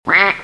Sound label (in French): Coin, Animaux; bruitage